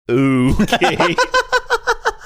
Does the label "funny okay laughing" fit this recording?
Yes